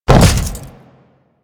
Land Default, Footsteps; Enemies; Warframe; Grineer

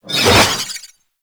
Sword Whoosh

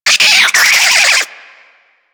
Infested Minion Bark, Vocals; Warframe

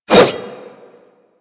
Dagger Heavy Whoosh; Warframe; Weapons; Dual Daggers

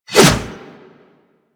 Pole Arm Whoosh; Warframe

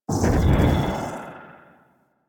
Void Enter; Powers; Warframe; Focus; Operators; Wraith

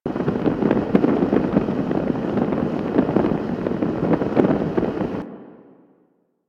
flames loop; ambience; props; loops; Warframe; Venus Open World; Venus Landscape

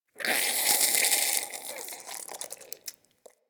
Infested Wheeze Close; Ambience; DE; Warframe; Infested Eris

